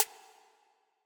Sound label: Snare